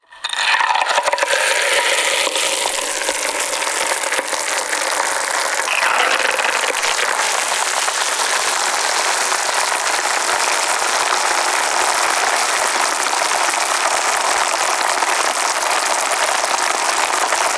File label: pop rocks hydrophone in a jar